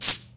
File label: chain get; Fate Undiscovered Realms; ITEMS